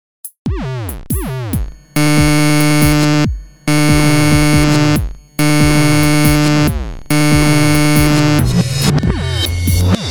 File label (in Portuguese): so mais um beat loco